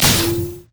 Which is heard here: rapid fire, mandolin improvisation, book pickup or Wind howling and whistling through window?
rapid fire